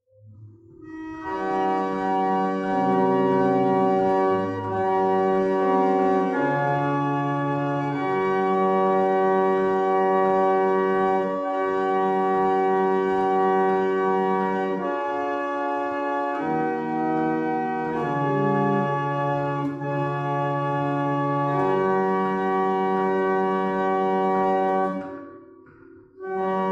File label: Single chant, barrel organs